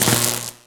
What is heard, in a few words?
explosion robot buzz